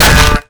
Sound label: melee guitar; weapons